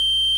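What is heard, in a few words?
organ Vox Jaguar, Continental; Roland LCDP09 Keys of the 60s and 70s 1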